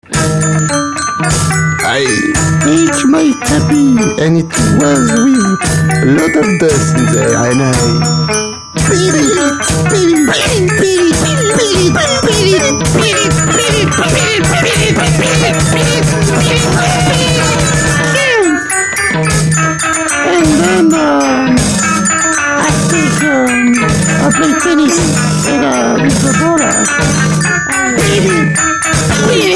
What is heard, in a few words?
beat